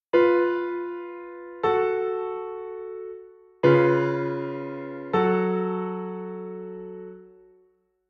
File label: mi sharp si fa sharp la